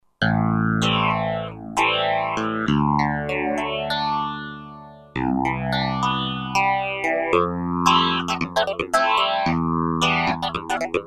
Phase Clav; '60s & '70s; Roland SR-JV80-08

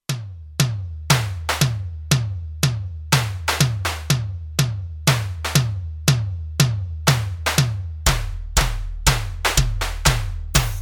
Techno Kit, Kurzweil K2500X; Factory Programs

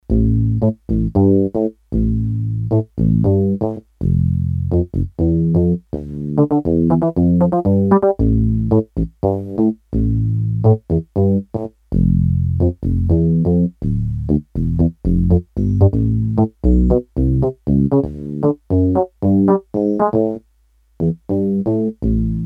Synth Bass, Korg DS-8; Factory Patches

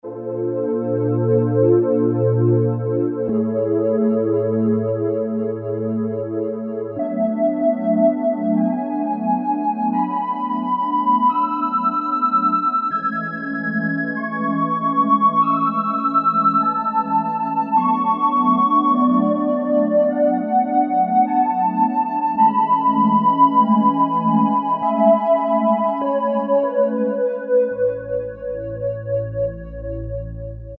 Hollow Voices, Roland MKS-70

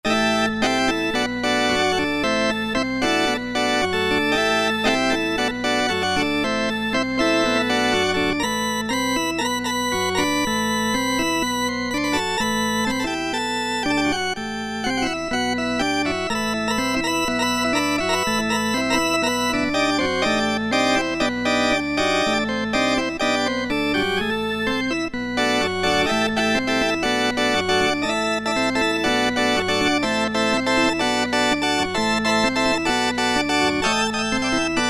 60's Organ 1, Roland SC-50 Sound Canvas; Factory sounds

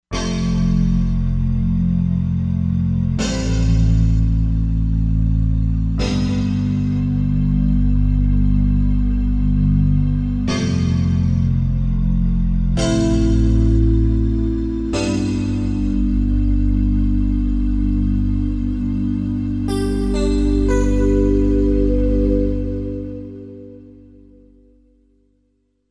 Metal Pad, Korg MSC-07S Synth

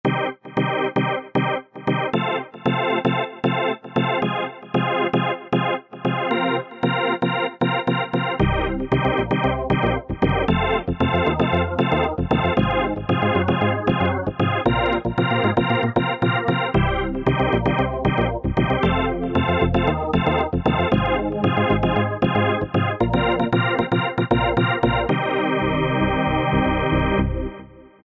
GROOVY ORGAN; Best Service Alpha Dance II

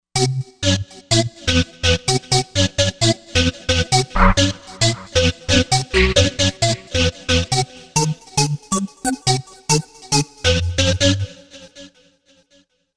Dance Steam, Roland Fantom XR

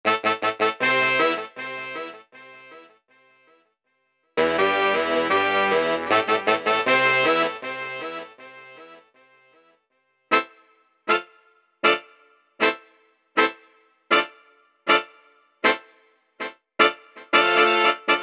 HORNS Dub Horns; Apple GarageBand 2

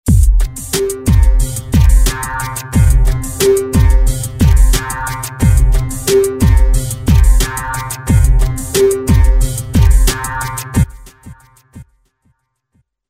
Roland V-Synth; MyChillout; Factory Patches

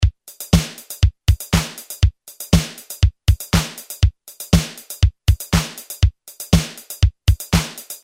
DISCO, Roland R-8

